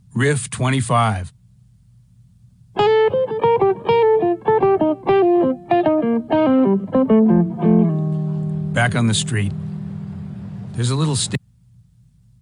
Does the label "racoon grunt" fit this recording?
No